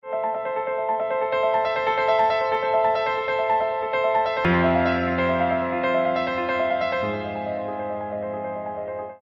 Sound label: piano